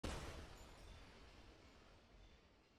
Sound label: explosion